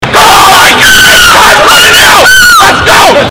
voice crack yelling

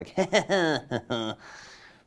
laugh; tobias forge

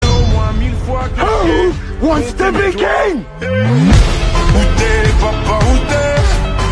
ahahahahxx

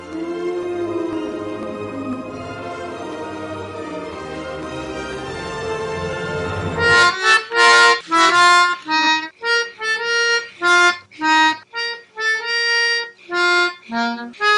melodica; jurassic park

